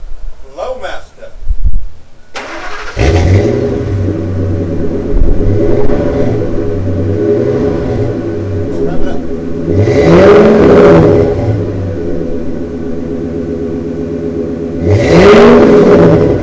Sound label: flowmaster